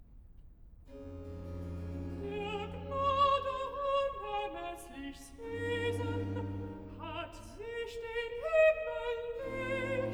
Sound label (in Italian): Recitativo (Alt); Cantata, BWV 121 'Christum wir sollen loben schon'; Bach; New York; Xmas Day + 2nd Day of Xmas